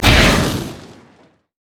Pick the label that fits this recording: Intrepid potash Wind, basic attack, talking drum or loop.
basic attack